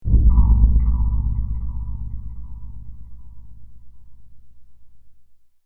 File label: Boom Impact Sinister Dark Scary Metallic; Boom Metallic Impact Sinister Dark Scary Eerie Ominous Horror